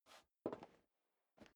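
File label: step dress boots metal dry scuff, footsteps; schritte; menschen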